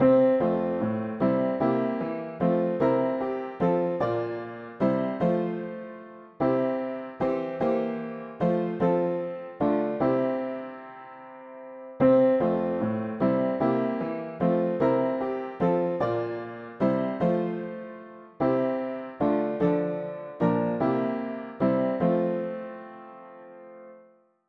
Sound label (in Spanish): mono piano; hymns; Himnario pequeno